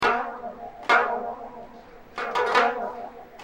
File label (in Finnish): kuoroefekti